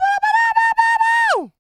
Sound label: yell, male human, R&B dance vocal; Spectrasonics Vocal Planet